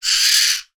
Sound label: bird owl striped chirp; sound effects one